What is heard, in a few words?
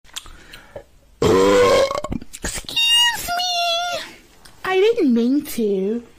burp excuse me; meme sound effect